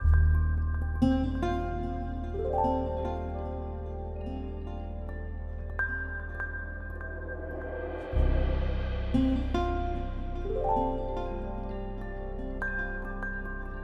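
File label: dessert mountain intro loop 148bpm G minor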